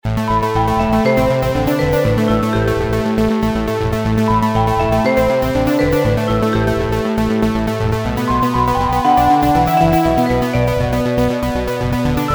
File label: Rhythm Is A Snap